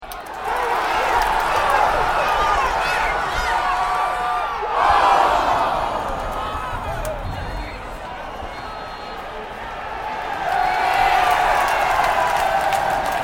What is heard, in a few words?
crowd 20K soccer cheer, Soccer at Docklands Stadium; Crowds